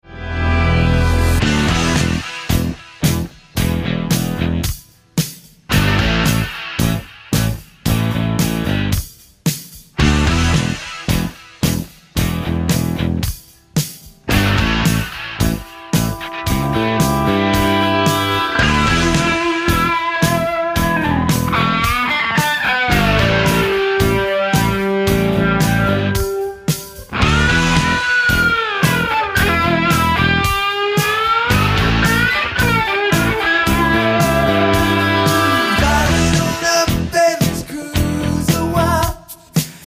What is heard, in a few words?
heavy ringtone